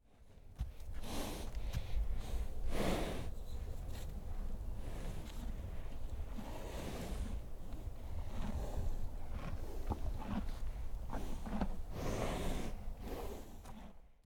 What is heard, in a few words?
Canadian Horses Breathing